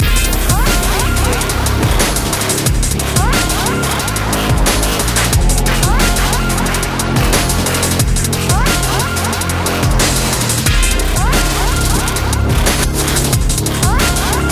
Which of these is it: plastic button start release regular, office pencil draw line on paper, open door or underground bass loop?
underground bass loop